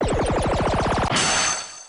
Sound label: Shell Smash; Pokemon Essentials; Pokemon Opalo